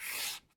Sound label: slide; jocly